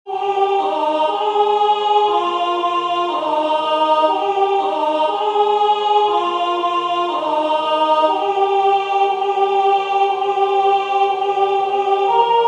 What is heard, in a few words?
O Du Frohliche Alt